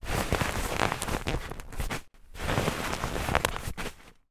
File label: toy inflated rubber ring movement in hand